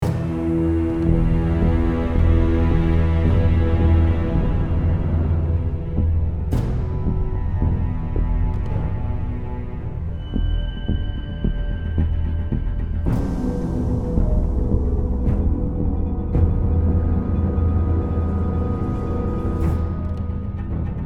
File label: Stealth loop